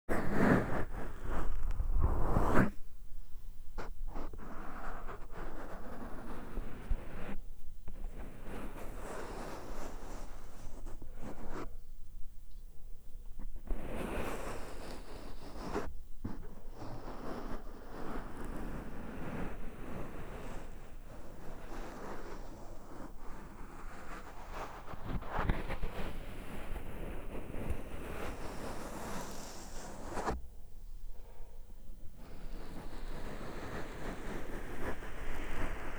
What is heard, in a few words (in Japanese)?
マッサージ